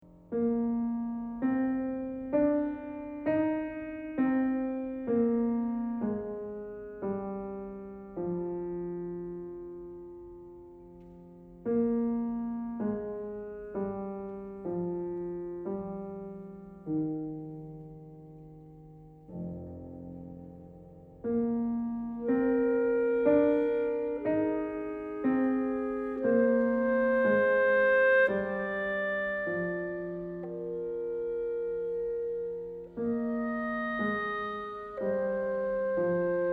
Clarinet Sonata II. Lento; Copland

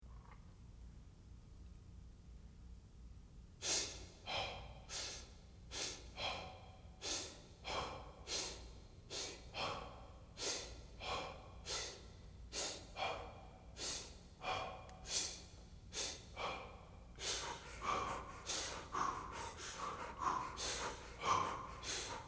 Rustle

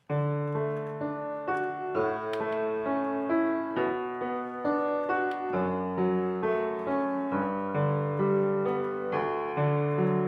Canon in D, piano flute violin

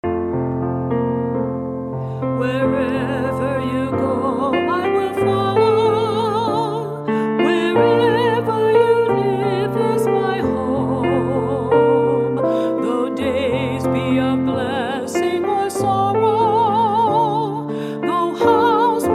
Covenant Hymn

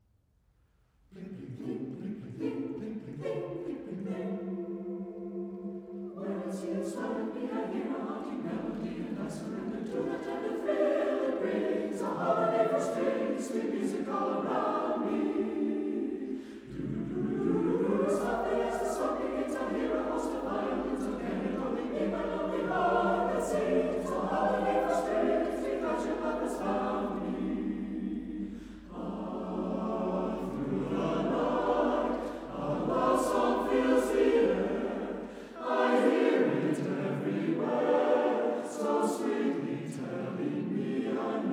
Strings

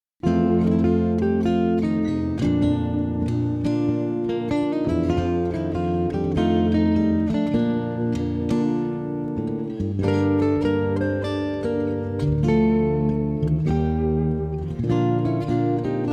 Acoustic Sketches

